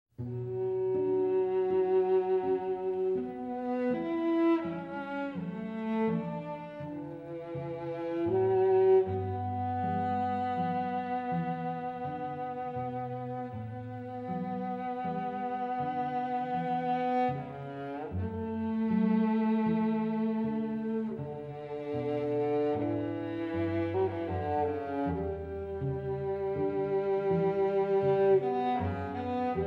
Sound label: Flute, Piano and Strings - Adagio